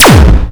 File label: laser